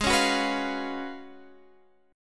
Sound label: Gb Major 7th Suspended 2nd Suspended 4th Ab, chord